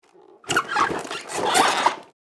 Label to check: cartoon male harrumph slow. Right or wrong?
Wrong